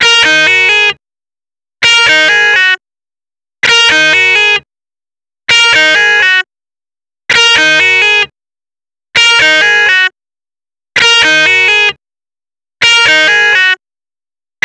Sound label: Guitar, Rock